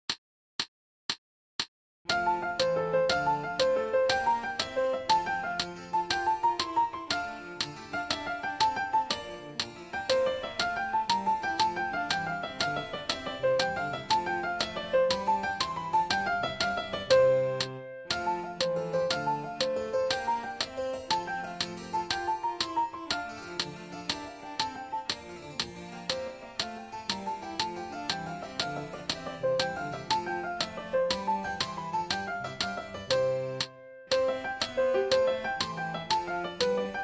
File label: giga repeats full tempo with solo